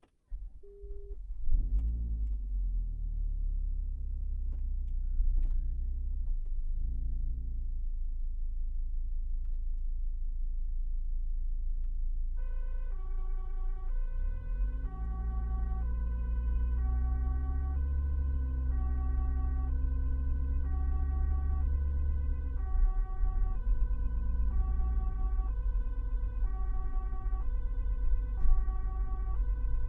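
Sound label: volvo v70 onboard start slow drive siren stop interior trunk; dpa4061; Sonniss GDC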